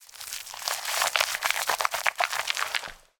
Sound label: shaving gel lather in hands